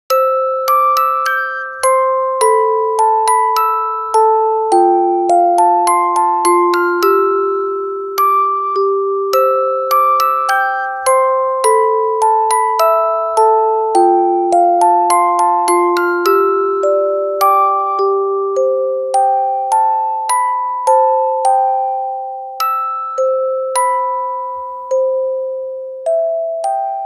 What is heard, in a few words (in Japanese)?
yumeroman orgel